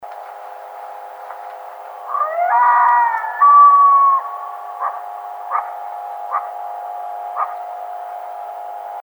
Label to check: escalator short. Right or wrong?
Wrong